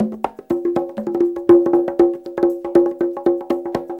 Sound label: CONGA LOOP, Perc Loops, Techno Trance